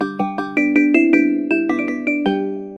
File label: xiaomi 12 pro sms ringtone